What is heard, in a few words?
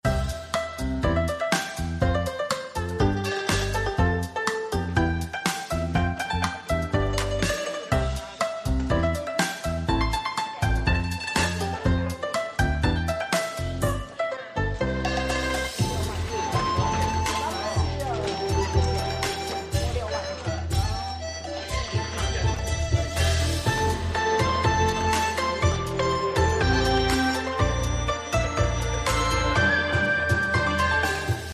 bgm